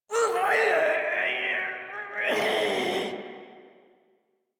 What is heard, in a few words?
Death; Warframe; Shik Tal; en